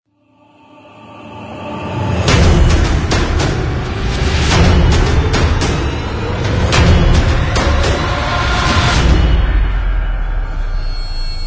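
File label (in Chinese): Epic Trailer Teaser, 强大的能量史诗项目与大鼓和管弦乐器。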